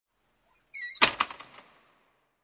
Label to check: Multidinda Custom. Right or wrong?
Wrong